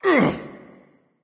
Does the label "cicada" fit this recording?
No